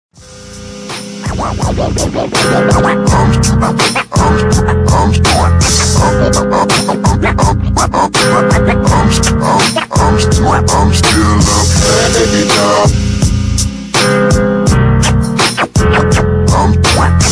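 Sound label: slowed and chopped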